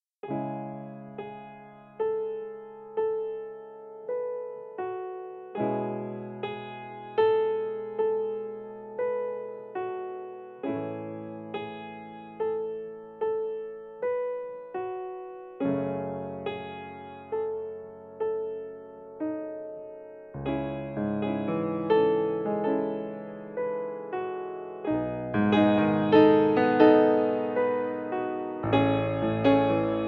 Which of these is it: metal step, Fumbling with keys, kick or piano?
piano